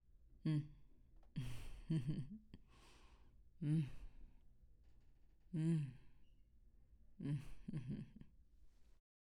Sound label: Woman Satisfaction Murmur